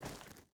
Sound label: footstep hide gravel walk; Rust